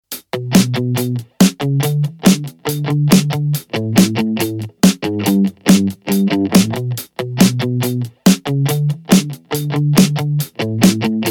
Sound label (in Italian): Capodanno funky background